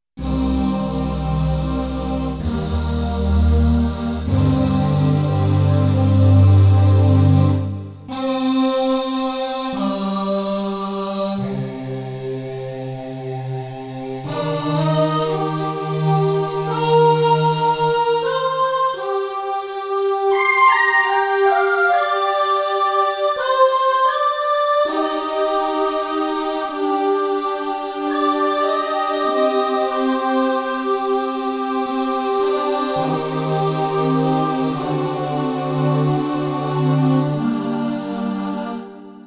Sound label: Voices; Triton